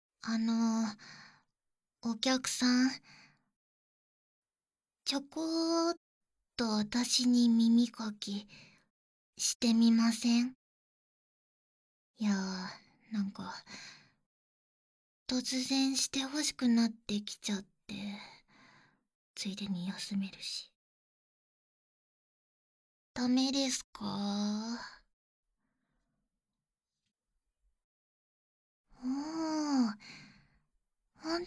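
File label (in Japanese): 耳かき（バイノーラルマイク 効果音あり大きめ）, ASMR; 小町に逆に耳かきをしてあげる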